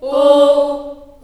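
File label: D3 Choir Children Ohs, Choir Acoustic & Synth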